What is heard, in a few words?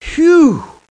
Whew; The Pea; SoftKey